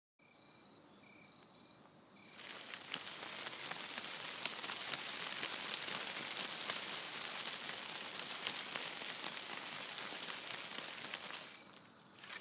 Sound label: Trees Shaking Sounds Like Rain